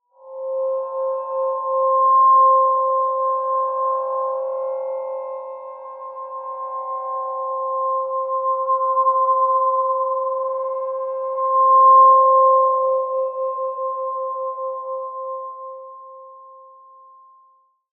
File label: C5 pp, healing soundscapes; HSS Ableton Pack1